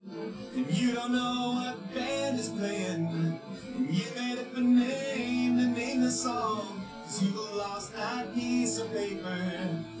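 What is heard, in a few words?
with drums; SiSEC10; underdetermined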